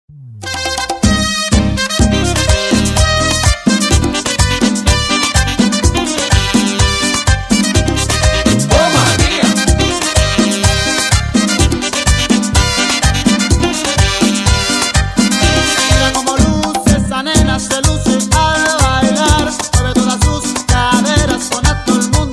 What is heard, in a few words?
merengue